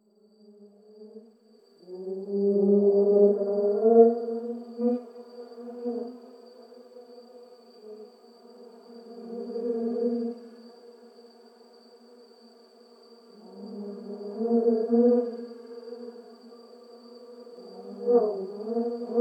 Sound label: Bee, Garden